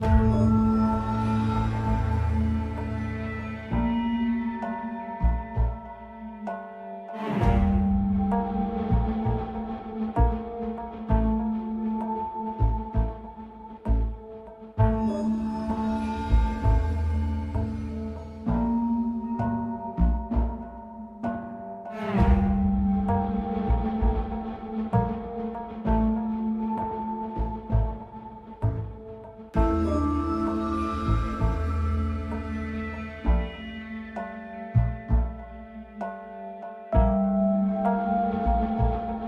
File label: Taxidermist Suspense; Hello Neighbor 2